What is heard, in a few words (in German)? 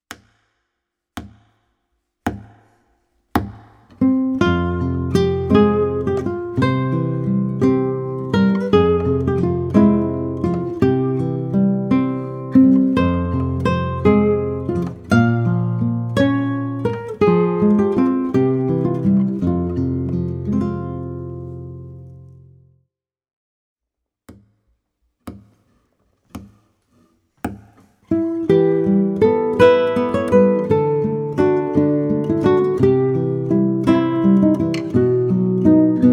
Gitarre; Hörbeispiel 3 - Ich hab die Nacht geträumet, Greensleeves, Kein schöner Land (Gitarre)